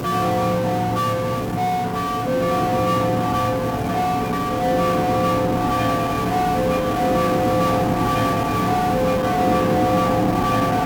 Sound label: drizzle loop; svencoop